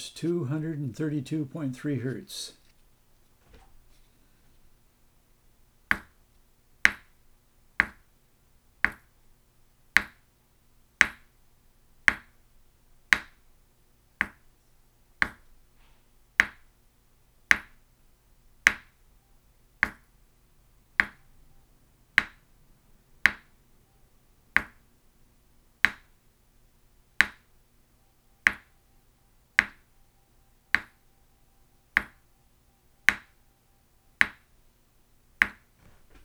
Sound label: 232.3 Hz tap tone with introduction